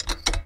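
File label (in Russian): кнопка щелчок высокий близкий звонкий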